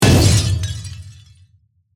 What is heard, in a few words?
Hit, Burst, Glass, Shatter; Production, Element, Imaging, Accent, Transition; Glitch; Blast Wave